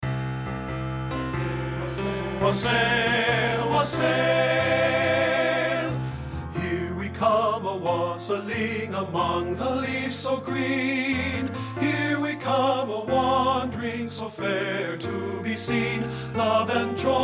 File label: HERE WE COME A WASSAILING TEN; men; partbypart